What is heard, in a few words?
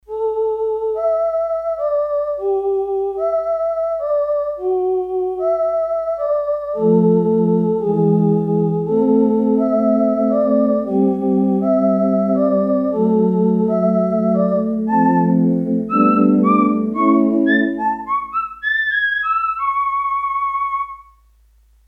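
Synth Vocal, Korg DS-8; Factory Patches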